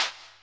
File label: SLAP